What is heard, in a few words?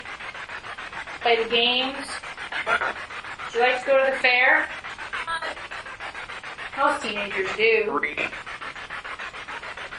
Master Bedroom Spirit Box; 2nd Fl; gill house investigation